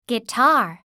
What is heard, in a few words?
guitar